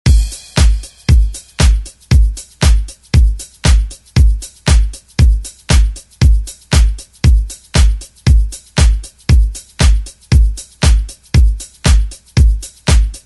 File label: 70s ReDrum; DJ Mhark